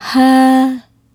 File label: "Hah" C3, Swingin' Single Notes